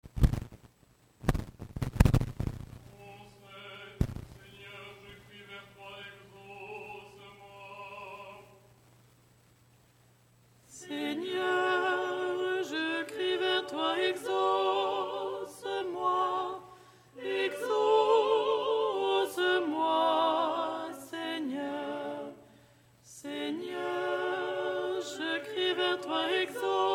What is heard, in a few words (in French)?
Ton 7 stichere lucernaire alto